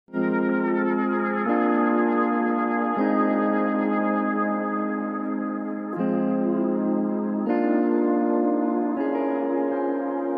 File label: movie ringtone whistle bgm; animal